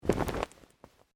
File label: Clothing shirt cotton flap; Movemement sounds for various articles of clothing; Dressing Sound Effects